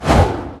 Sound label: final boss jump